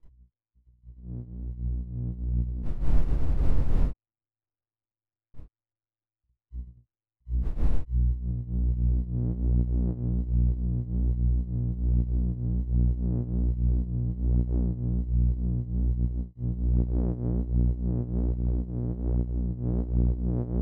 Flux net Flux LEFT release RIGHT x10 rate no voice